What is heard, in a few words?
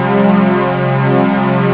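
saw sustain; jazz jackrabbit 1; M8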